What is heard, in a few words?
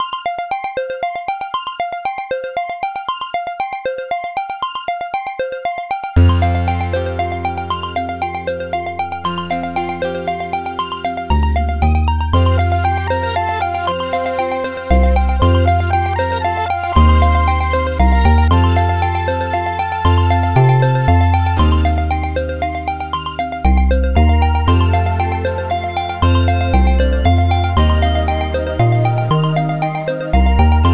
Startup Music; FM Towns Marty bios